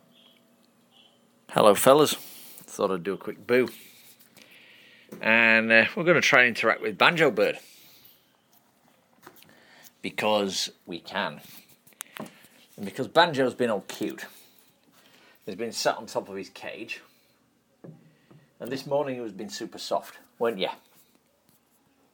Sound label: banjo bird